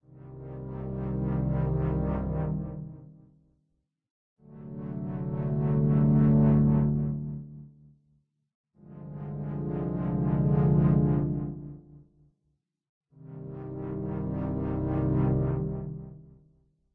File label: walking; Monster Breeder OST